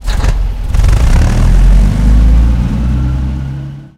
centurion engine start, tanks; War Thunder

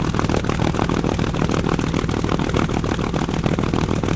bomber rear, engines; War Thunder

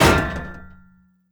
door impact metal; Rust

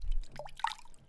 water shake; Civ4

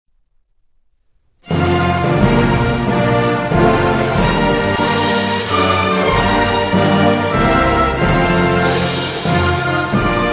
Music; United States; DCS

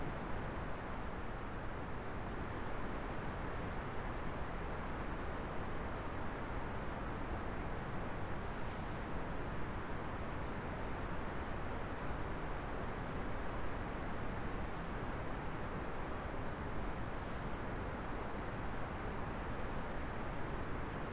ambience base warm mellow; Rust

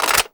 grenade launcher reload

